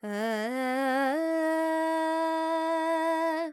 Panthuvarali, Carnatic Female, alaps, vocals, 100 G, dry; Sample Banks